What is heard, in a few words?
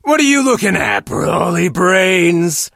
monster hurt